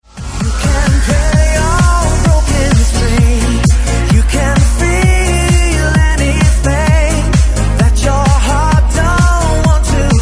ringtone

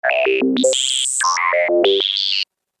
interlude synthetic ringtone

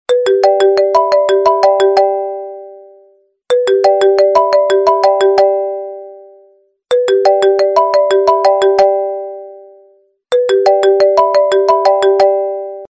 iphone original tone ringtone

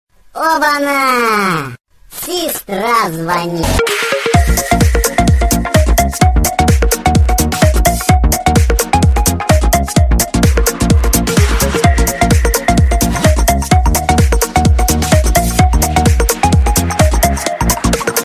russian ringtone

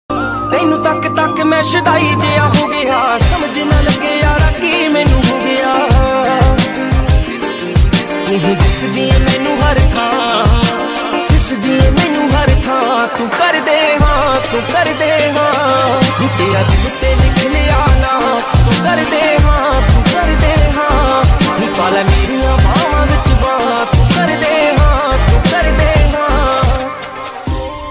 punjabi ringtone

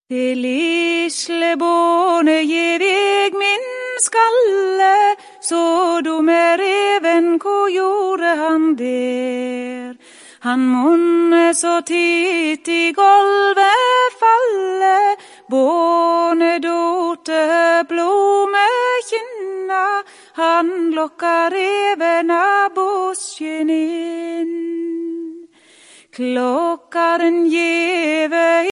norwegian lullaby tone

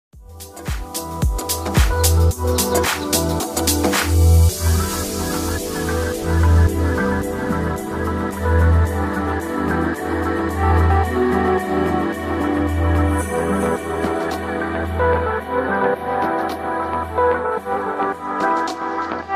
electro ringtone

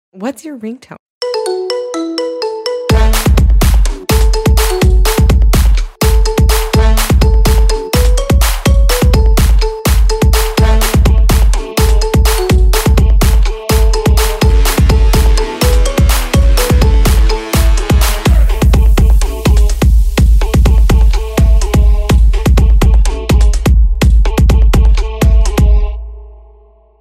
marimba remix ringtone; for iphone android

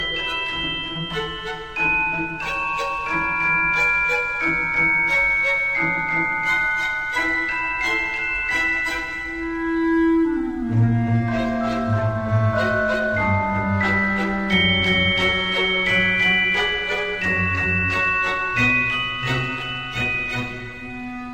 classic sound ringtone

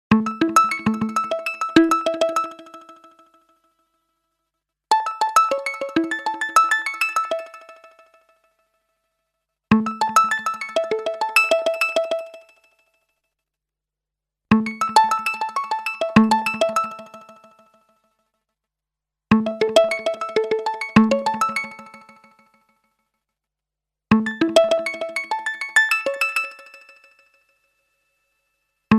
nokia 8 official ringtone

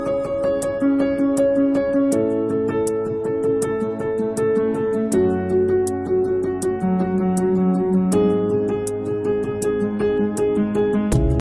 amazing piano ringtone

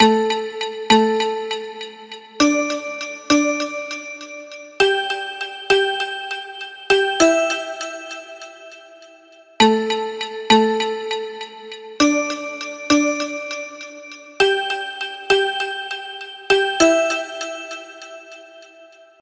echoing timer ringtone

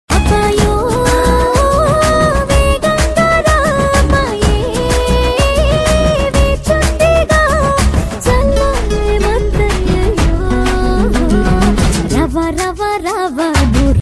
oppo f7 ringtone

